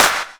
Claps & Snares

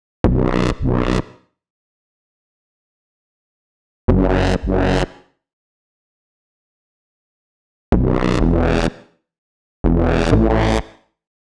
125 Bm Bass, electro synths; Prime Loops; Complex Electro Synths; Focus

